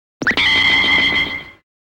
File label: Scene Transition Effect; BWN